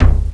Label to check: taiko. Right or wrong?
Right